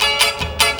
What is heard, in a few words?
TANBURA; Best Service; World Colours